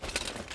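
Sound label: footstep equip movement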